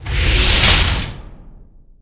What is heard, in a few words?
scale; 1997 MMX LAN PACK (win 95)